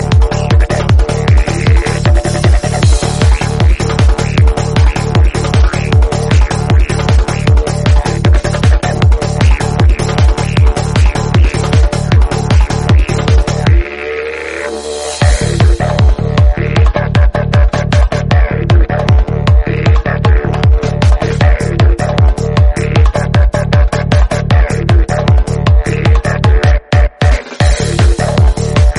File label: psy trance